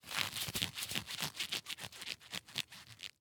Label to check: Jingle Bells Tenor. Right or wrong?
Wrong